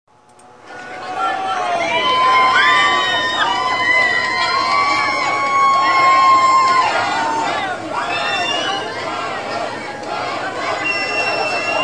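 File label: cheers of greeting at festival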